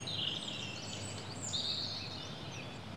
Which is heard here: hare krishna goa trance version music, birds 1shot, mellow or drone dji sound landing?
birds 1shot